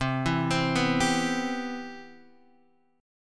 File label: C Major 7th, Guitar DADGAD